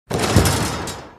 basketball shoot

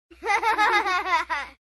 kids giggling and laughing

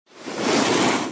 Snowboard Stops

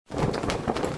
foley clothes trousers shake

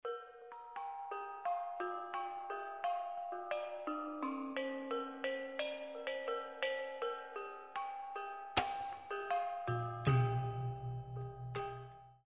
level menu bgm

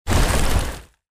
stomp

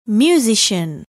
musician

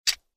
clock ticking

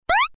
suprised toing

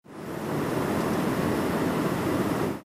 sea sfx

